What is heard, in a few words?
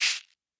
shaker; dough-samples; eddyflux